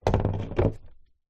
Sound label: sport surfboard longboard down on hard ground